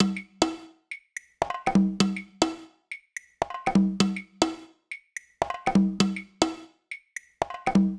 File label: Cn-Bl Loop 120, Perc Loops, Loungin House; Equipped Music